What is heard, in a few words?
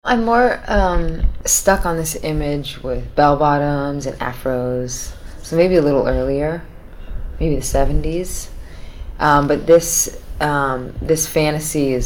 eighties roller disco